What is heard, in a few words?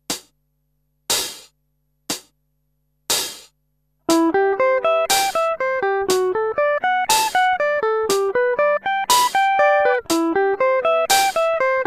finger hard